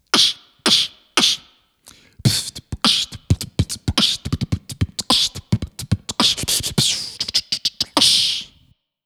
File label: sample beat